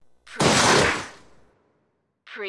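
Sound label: Loud Smash, Smashes and Crashes; crush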